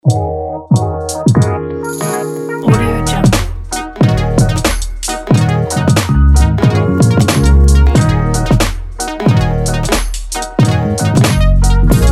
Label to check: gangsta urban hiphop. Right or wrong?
Right